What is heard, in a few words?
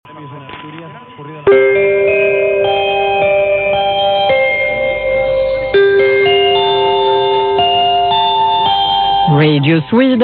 radio sweden int sig